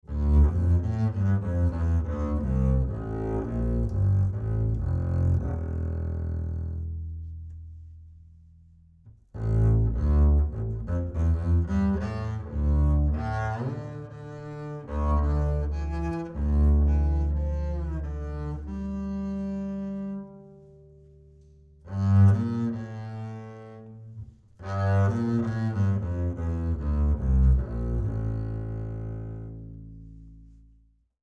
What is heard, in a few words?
Ac Bass 25cm R Bowed Low Mid